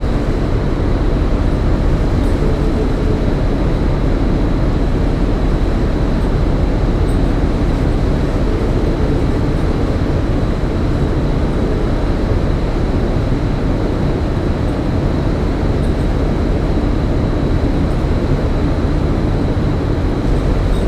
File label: transport boat ship ferry external engine near funnel drone moving